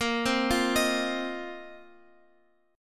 Bb Suspended 2nd Suspended 4th Bb down Guitar Open F; Bbsus2sus4 arpeggiated